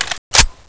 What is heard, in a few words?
clip in, Reload